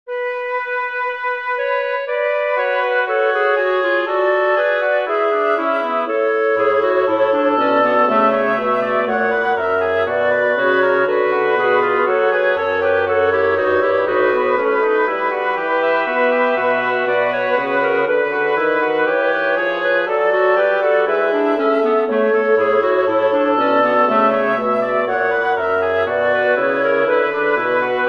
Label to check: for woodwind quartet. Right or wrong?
Right